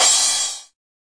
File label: hard tiny crash, DRUMS (SINGLE HITS), Crashes